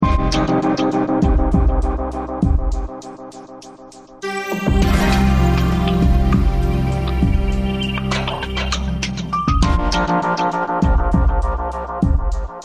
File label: loop reveal end; rollercoaster